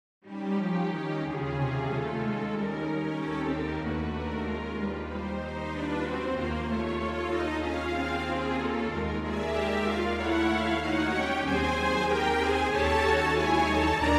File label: strings